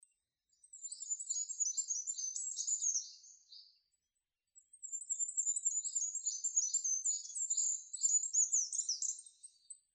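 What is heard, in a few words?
goldcrest song; kikuitadaki saezuri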